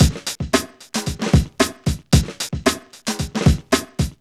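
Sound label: 113 bpm, Funky loops; Zero-G; Funky Elements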